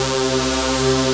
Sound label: SILVAPAD, pad; Best Service Dream Experience; BRIGHTY